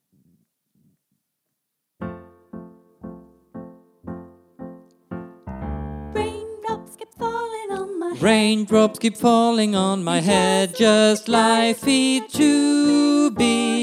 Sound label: Bar, seniorkor; korsang